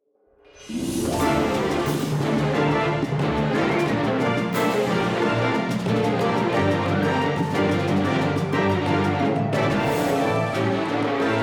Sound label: Action; Gamora's Family